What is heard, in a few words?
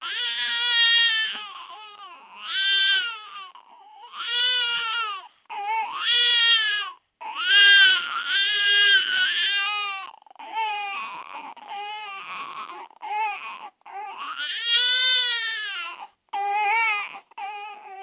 crying newborn baby; humans